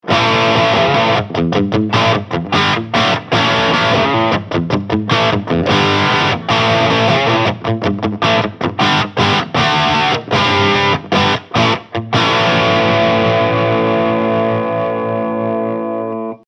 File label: Crunch; ESP LTD KH V Metallic Gold